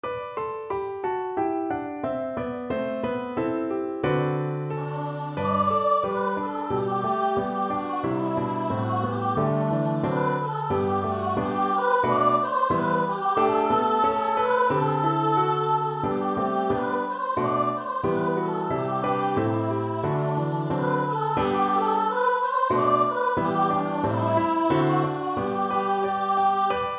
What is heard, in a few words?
solo voice piano in G major; the moon